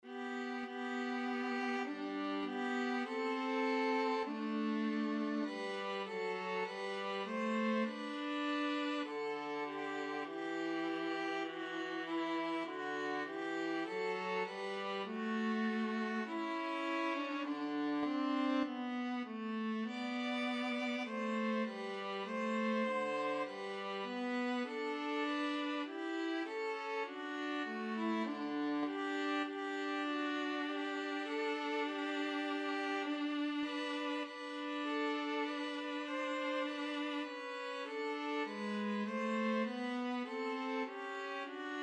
o come, violin viola duet

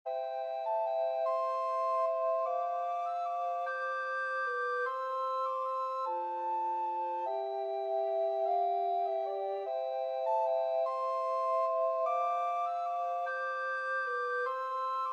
skye boat, recorder trio

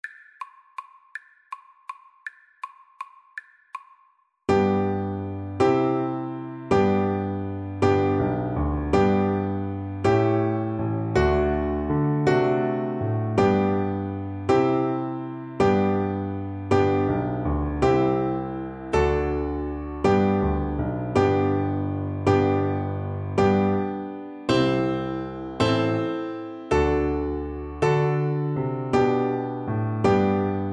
Bassoon; My Bonnie Lies over the ocean

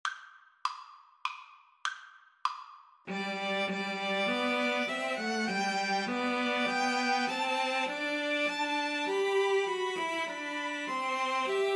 STR TRIO

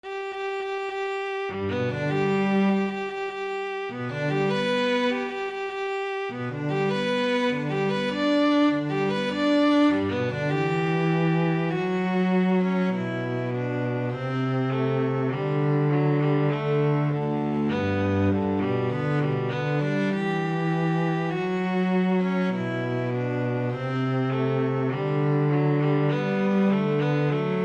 wedding march duet, viola cello

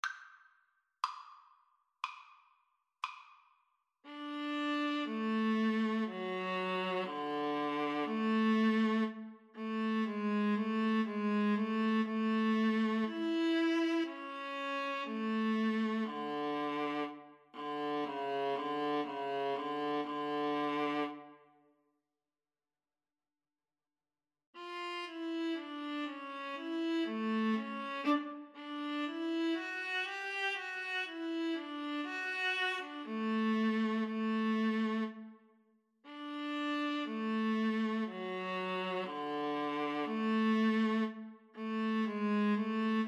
Violin Viola Duet

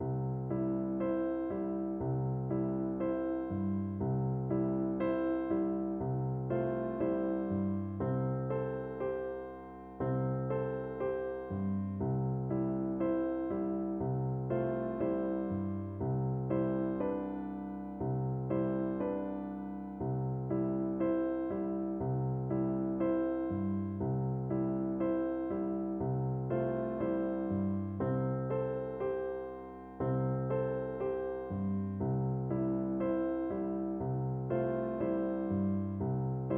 irish lullaby, Flute

